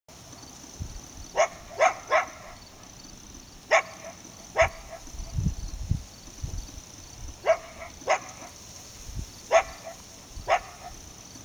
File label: dad howls, coyotes; pics coyotes